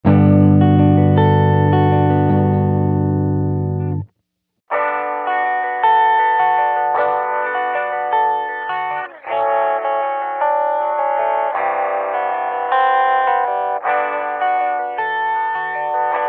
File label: destroyer, mobius